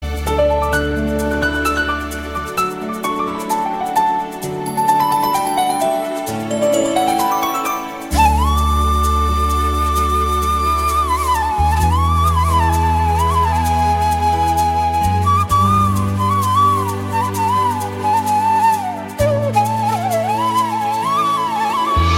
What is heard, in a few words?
Ring Music